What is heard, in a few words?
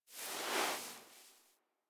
Foley Idle Sit; Warframe; Kubrow